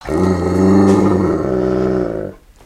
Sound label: dog bark